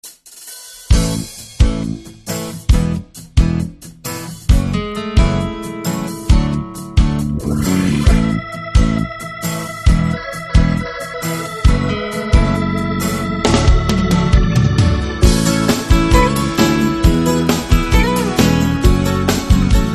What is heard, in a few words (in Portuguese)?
banda acustico